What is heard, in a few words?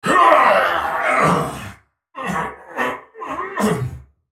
dying; Viktor; Character Voices - English; en US